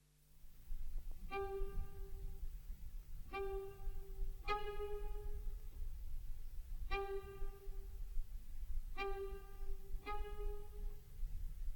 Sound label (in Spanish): cuarteto de cuerda; Witold Lutosławski